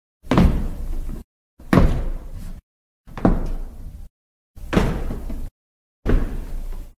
Stairs

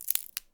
Branch Snap x05; DeadFright

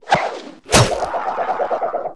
Cartoon Teddy Bear Fan; SFX Kit; Foley - Misc

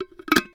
Specimen Jar Close Lid; Medical; Blastwave

